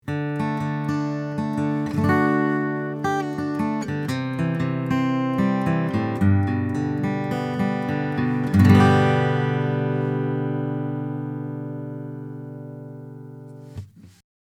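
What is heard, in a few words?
Acoustic Guitar RND RMP D8 Picking Beyer 201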